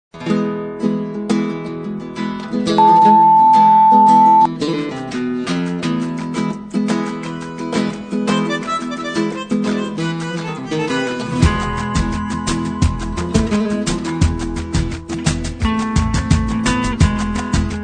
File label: Tango; Bogata; Mr Mrs Smith